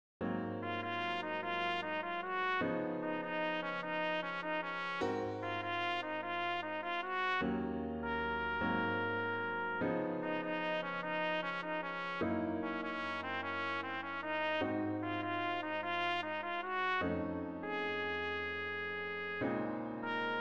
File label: blues